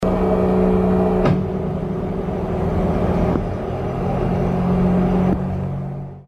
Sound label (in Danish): arriva boing, En karakteristisk "BOING" lyd, der høres af og til i Arrivas Lint-togsæt.